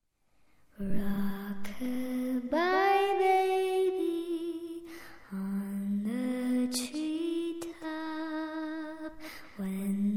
creepy little girl singing a lullaby rockabye baby